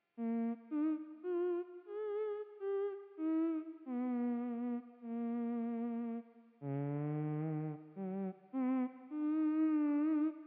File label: Theremin; Hylics